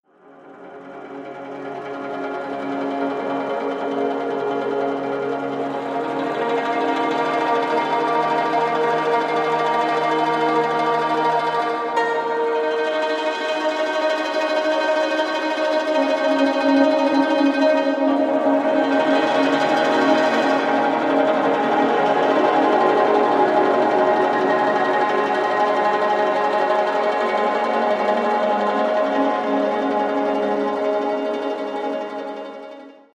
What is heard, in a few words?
RING; PlanetX